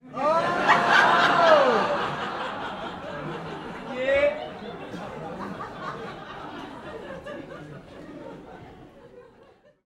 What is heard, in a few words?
human audience laughter one man cheers comedy club; komedia comic boom